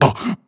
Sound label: breath short, zombie